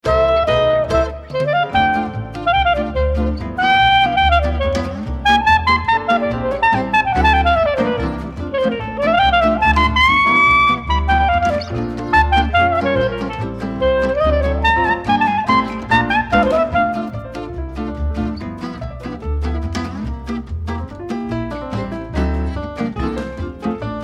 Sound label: Clari Swing